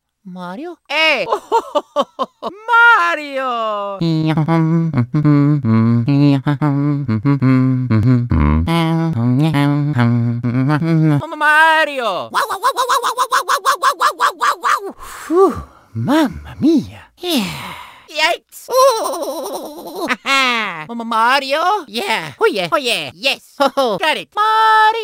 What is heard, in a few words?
luigi noises; luigi s mansion